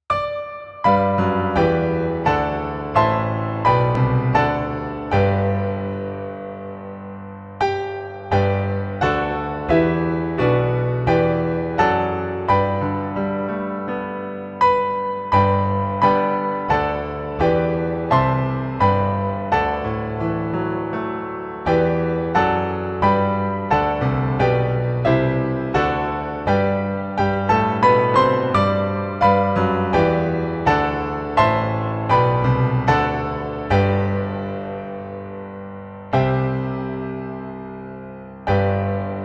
doxology praise god from whom all blessings flow piano